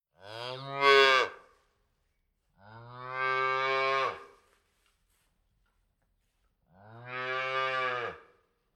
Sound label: Nature Animals Calfs Baby Cows Mooing Hungry Straw Movements Multiple